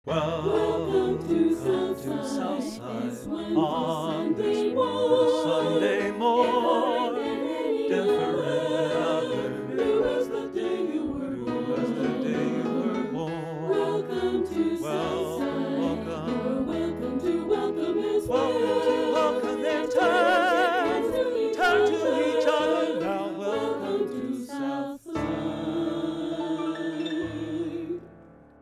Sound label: Welcome Song